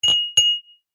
household glass champagne flute clink on solid surface